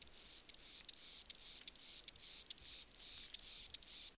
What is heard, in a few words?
critter, wildlife; matmos hl